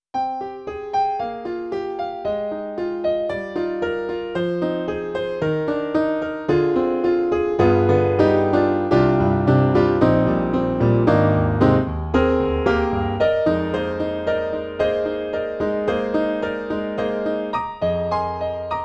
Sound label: E-MU Holy Grail Expansion ROM, Rock